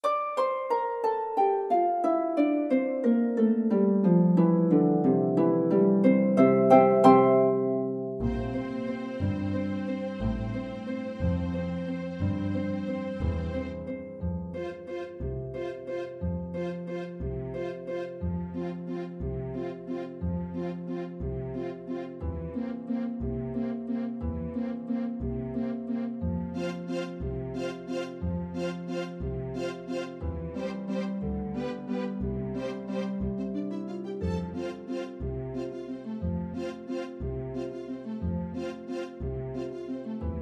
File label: Oboe